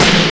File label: beat snar